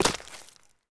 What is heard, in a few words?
mud, Players